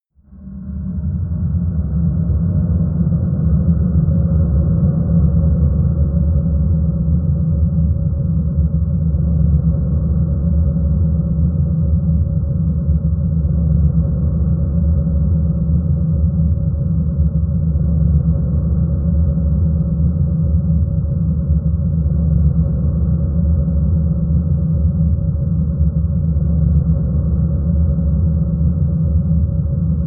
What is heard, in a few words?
drone tumbler